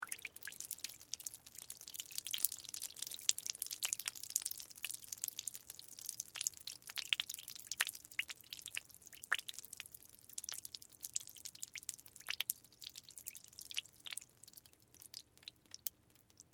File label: Water Drips; Nature